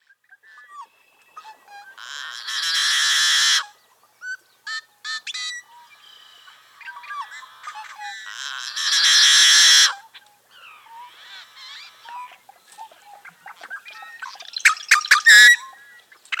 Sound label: yellow headed blackbird song